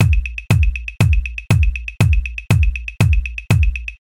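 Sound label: 120 bpm 16ths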